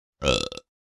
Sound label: Female burp strong and throaty